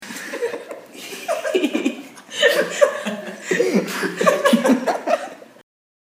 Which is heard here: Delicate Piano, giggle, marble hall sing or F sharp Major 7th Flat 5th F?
giggle